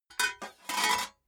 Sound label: metal plate small handling